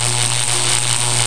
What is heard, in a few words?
zapper